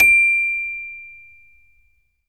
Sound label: bell